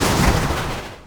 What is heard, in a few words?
sprint, footsteps; slardar